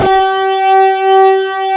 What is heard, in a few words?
TURBO C5; East West - Global Sounds; DIST.SOLI